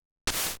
package open; Namco Museum Archives Vol. 1

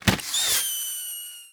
Crossbow Shot; LooterKings

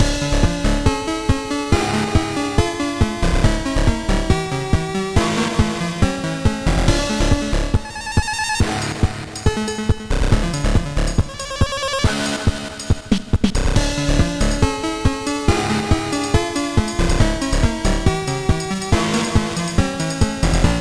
Egypt slow Loop; high strangeness; wii u

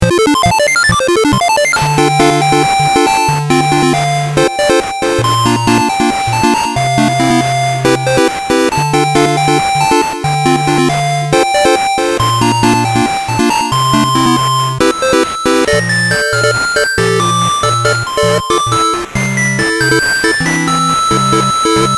8bit Blocky Jam; blocky bot; wii u

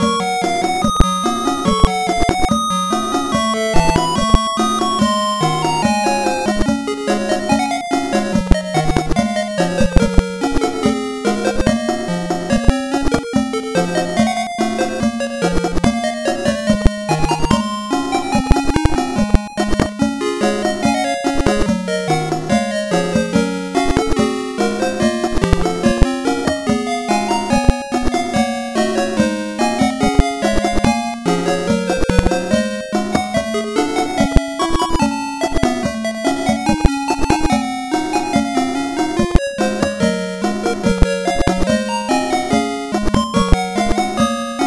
Picture Book BGM

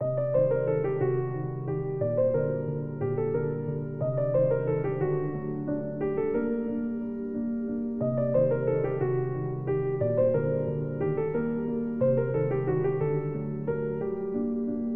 Abandoned Lighthouse Exploration Loop; Curse of the Sea Rats

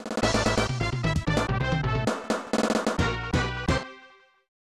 PK Announcement; J.League Excite Stage 96; SNES

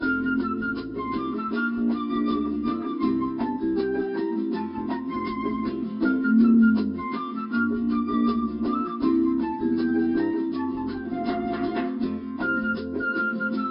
Music radio country - radio tropical; Spider-Man 2 The Game 2004 PC

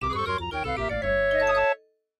MIDI Animation stings

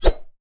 Medal Spin

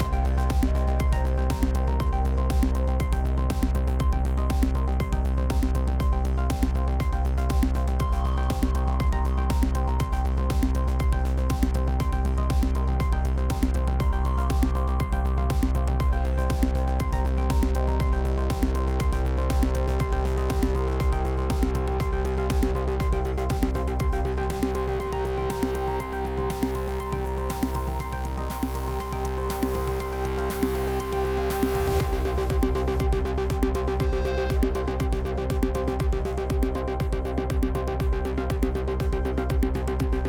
Downtown Dash 8CH 120BPM

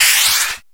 Throw Clam; Multiplayer SFX; Clam Blitz

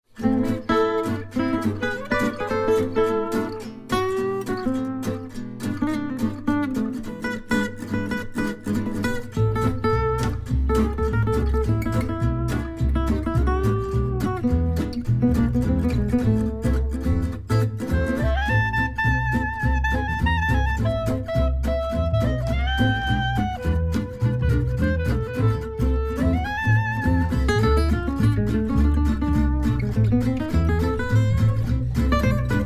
Radio Music; Jurassic World Evolution 2; PS4, PS5, Windows, Xbox One, Xbox Series X/S

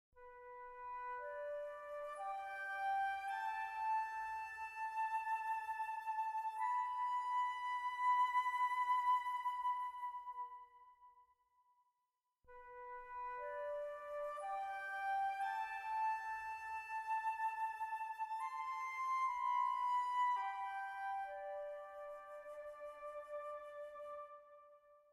Stinger Gameover Positive Stem Flute

